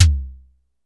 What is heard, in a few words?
Drum X Tom, Pearl